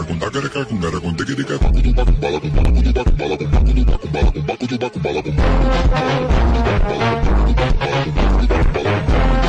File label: phonk; Tree